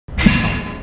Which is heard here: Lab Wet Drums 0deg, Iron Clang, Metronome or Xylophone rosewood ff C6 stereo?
Iron Clang